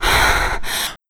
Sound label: breath, exhausted